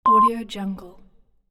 beep; minimal-studio

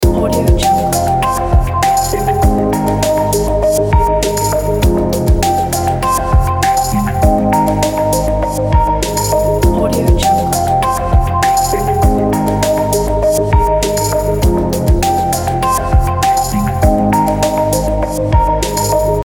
ambient chill loop

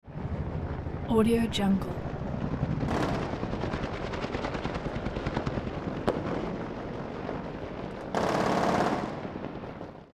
helicopter assault; hollywoodedge

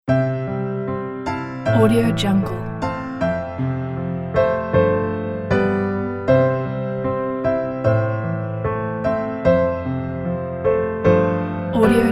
light piano logo

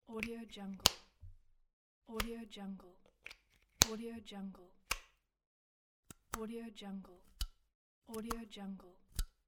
electrical socket plug and unplug

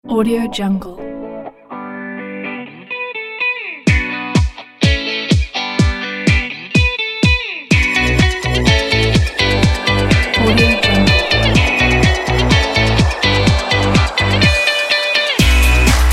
upbeat summer electro pop

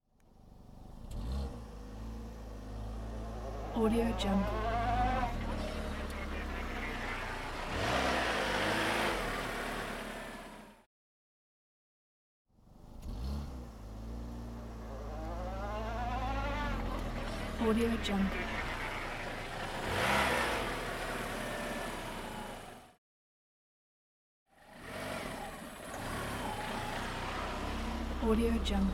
car reverse driving

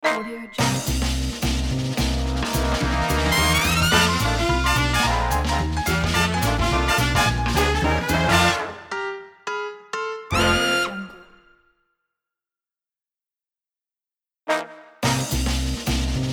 hipster big band logo; drtimwaters

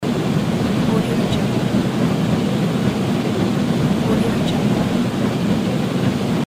conveyor

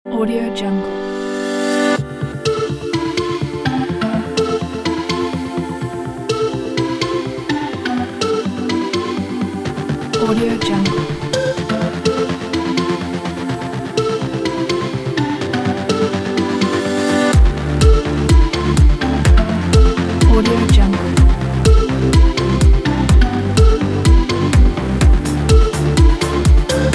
pop dance

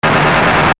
short heavy static; sound ideas

